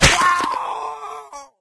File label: wolf; cstrike